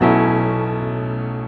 pno b, pianochords; house; Vocal House; PS-28 Vocal House Samples

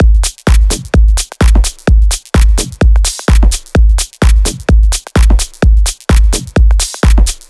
128BPM Kit; VFH1 128BPM Roboto Kit

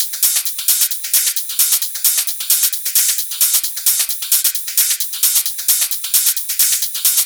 132BPM Elemental Kit

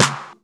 Claps & Snares

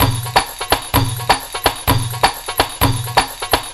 Latin House Loop 128 BPM; Vengeance Samples

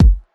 Bassdrums

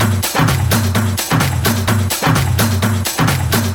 Percussive Loops 128BPM; Vengeance Samples

